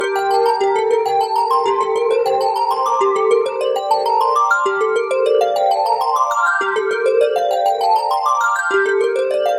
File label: G Seq Rising Bells; Zero-G; Future Wave